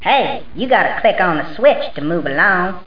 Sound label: bug; How Many Bugs in a Box